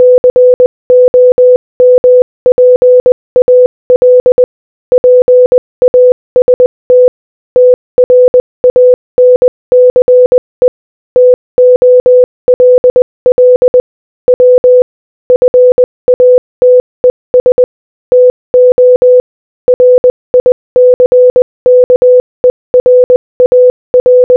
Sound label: Morse Code Audio Speed 15 15